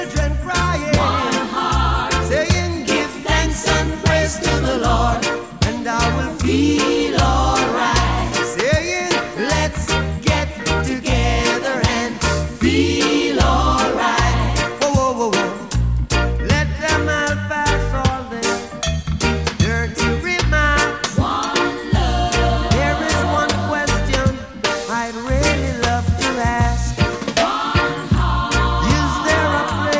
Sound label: reggae